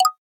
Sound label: drumset, drums; SKY IM-A850S-VEGA-R3; keytone